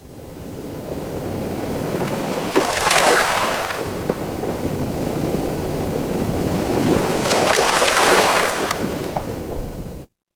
ambience ocean

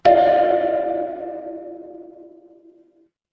instrument wood block

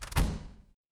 door close

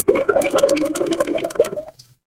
water pour

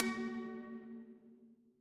instrument bell

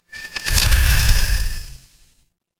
magic scifi portal

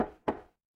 impact wood knock

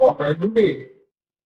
ui low battery

